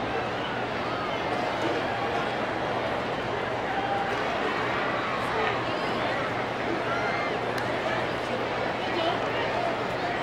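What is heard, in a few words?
baseball crowd ambience loop; Crowds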